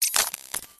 nanotech complex short reversed robotic robot cyber tech nano micro reversed; PMSFX